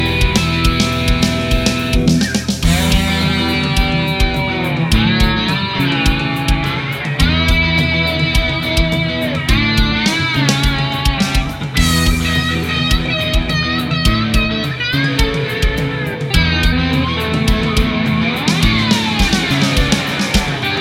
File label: huawei p20 lite ringtone